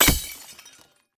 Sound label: Ice Shard; Elora